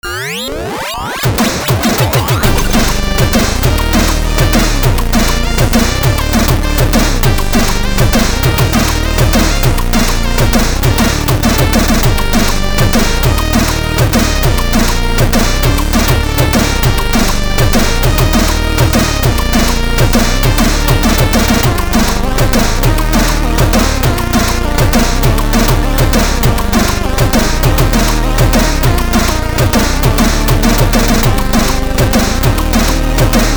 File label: Chiptune Remix Instrumental